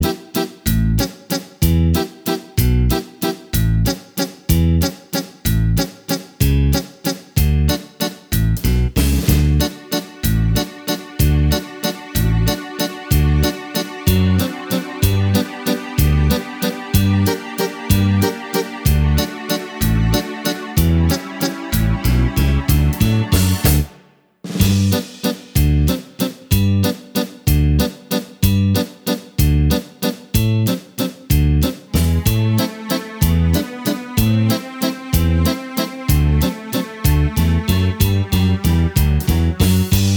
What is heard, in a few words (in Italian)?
fisarmonica in festa valzer